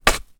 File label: industrial shovel metal dig dirt soil; zapsplat